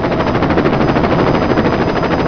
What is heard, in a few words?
chopper; quake; fortress; ambience